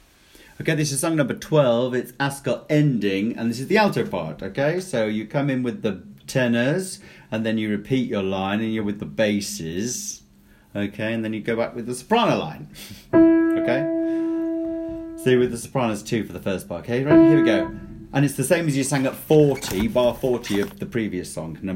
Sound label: Alto part; Ending; No.12 Ascot